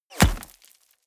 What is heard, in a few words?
bullet small impact dirt; krieg-waffen; geschoss